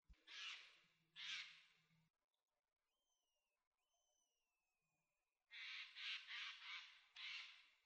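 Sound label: Eurasian jay call; kakesu nakigoe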